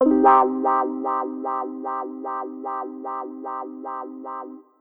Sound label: Wahwah; 70’s Funk